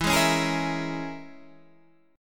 Bb Minor 7th Flat 5th E

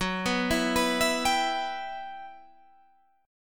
E Minor F sharp, chord; Em/F#; arpeggiated

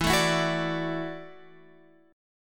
E 7th Suspended 2nd Suspended 4th Guitar Open F; strummed

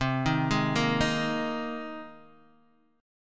C Minor 7th Sharp 5th, Guitar, DADGAD; down; chord shape

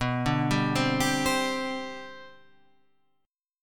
C Major 7th Suspended 2nd B, chord

